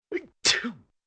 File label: little sneeze